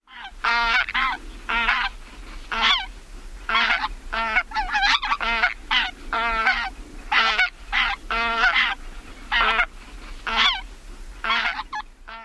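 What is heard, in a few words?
other calls, bird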